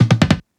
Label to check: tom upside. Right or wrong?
Right